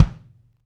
KIK, Real Kiks; Club-50 - Foundations Roland

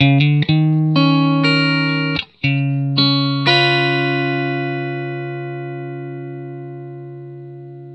TURN 1 GS, Vintage Blues Guitar, 120; USB Soundscan vol.22; AKAI

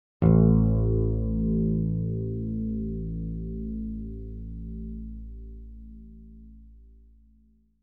bass bright a1; Sound Sampling Collection VOL-5; Drums & Bass Fusion Funk Effect

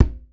tumba, congas; Voice Crystal - Steve Reid Definitive Percussion

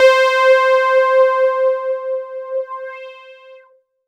C4, Synth Line; NiceBeats

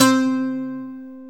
SAZ C 3; Soundscan vol.53; Mediteranean Traditions; AKAI

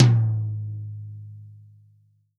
TOM, Real Drum Kit, POP KIT

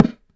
Thunder Drum

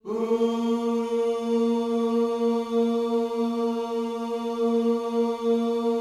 OOS A#, Vocal Gospel

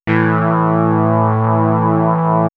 Swirls Drone, pads; X-Static Goldmine

